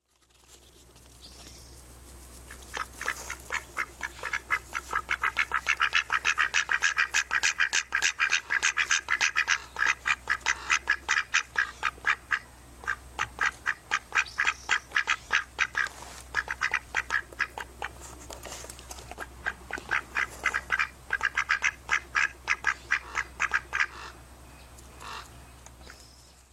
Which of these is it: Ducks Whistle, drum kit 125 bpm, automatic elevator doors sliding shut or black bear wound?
Ducks Whistle